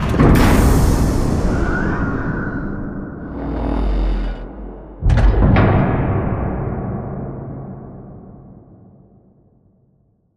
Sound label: Giant Floor Panel Lower Rotate Mech; Boomtown; Sideways Town; Titanfall 2